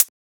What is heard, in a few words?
Closed Hat; FLPRO